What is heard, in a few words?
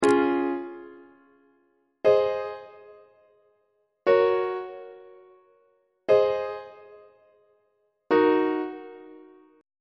c sharp major deceptive cadence; treble clef